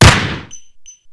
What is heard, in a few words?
Browning